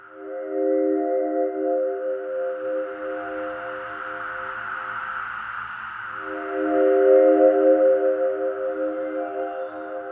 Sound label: Gameover; Gal Gun VR